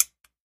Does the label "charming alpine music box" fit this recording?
No